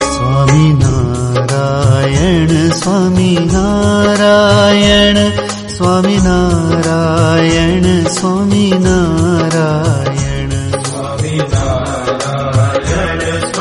devotional song; swaminarayan

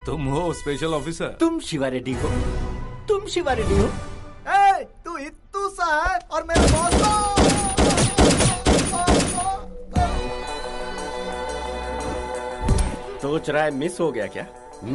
whistle; kill bill

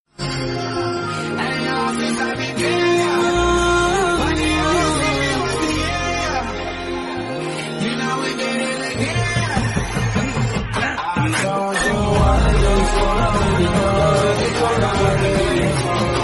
trailer bgm tamil movie; viruman

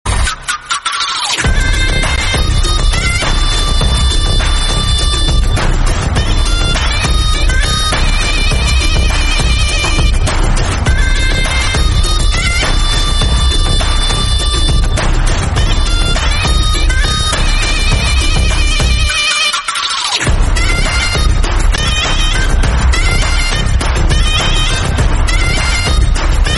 entry bgm tamil; rolex entry; vikram

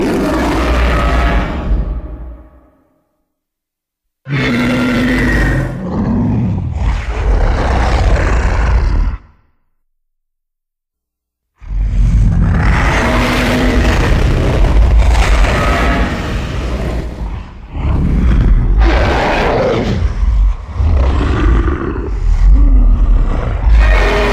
zilla roar

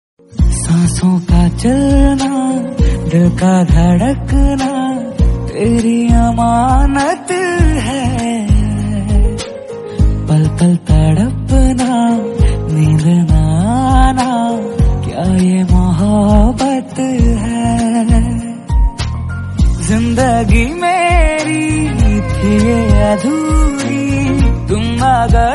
hindi love

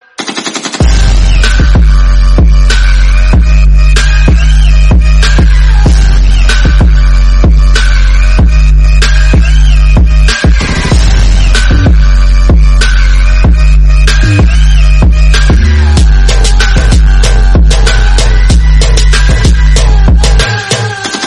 gangster bgm attitutde remix

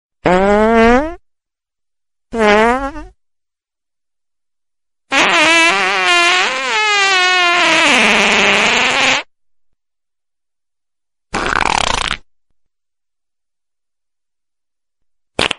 paad fart sound funny

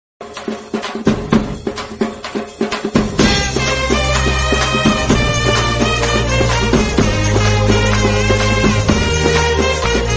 friendship bgm

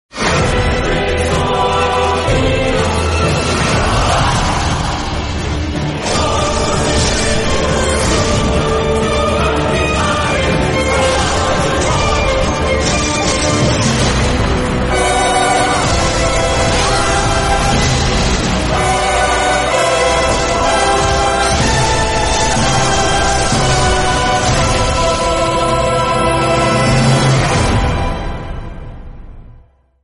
arena, bgm; Shadow Fight

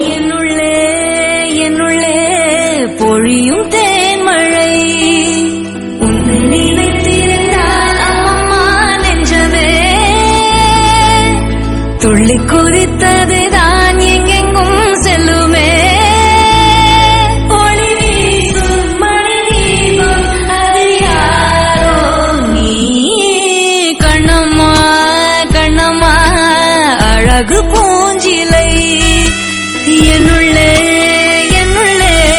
song tamil

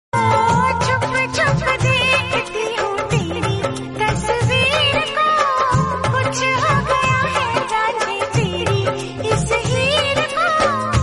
romantic hindi

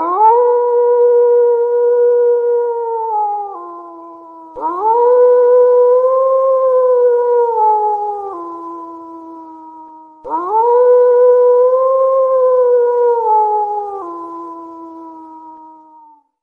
wolf howling wolf sound; wolf horn sound effect

